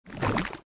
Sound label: Ink Jump; Fancy Pants World 1 Remix